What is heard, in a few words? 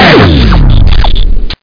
shotgun; DUKE